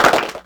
Clapbox Pitch3; Ableton Foundation FreePacks

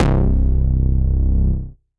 106 Bass; Synth

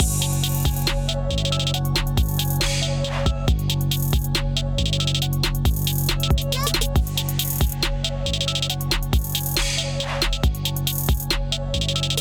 Kit groove 69 bpm; MIDI Clips; Takeover Kit groove; Ableton; Factory Packs; Beat Tools

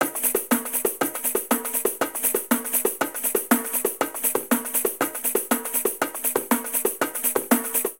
Samba Tambourim Pandeiro 120bpm, Latin Percussion; Ableton; Factory Packs

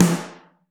Snare Mono

